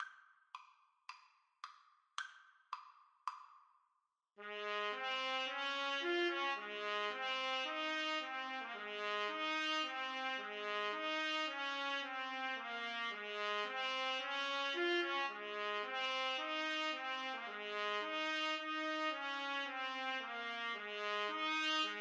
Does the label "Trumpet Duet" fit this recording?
Yes